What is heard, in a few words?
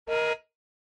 Car Horn Fake